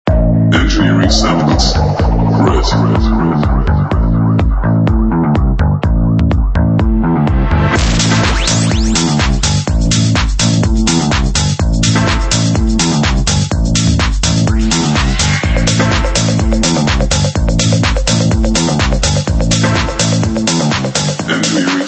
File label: Swanky Tech House; Engineering Samples